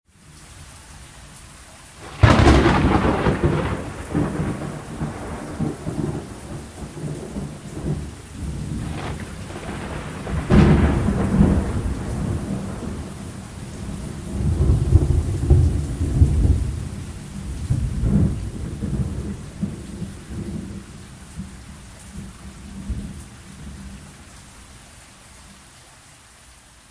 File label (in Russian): strashnye udary groma